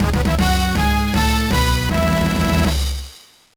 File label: Jingle; Hamtaro Ham-Ham Games; Tottoko Hamtaro Ham-Ham Sports; GBA